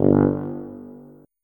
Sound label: tuba note